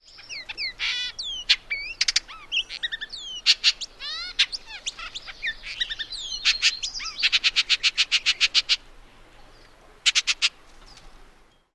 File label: extended song